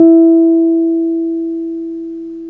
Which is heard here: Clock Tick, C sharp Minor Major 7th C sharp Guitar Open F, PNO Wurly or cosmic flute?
PNO Wurly